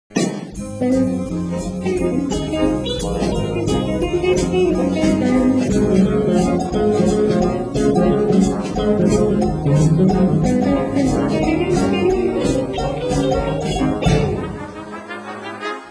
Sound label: guitar